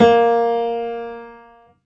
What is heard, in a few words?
Piano, Harmonic